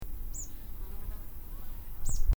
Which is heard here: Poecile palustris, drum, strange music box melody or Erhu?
Poecile palustris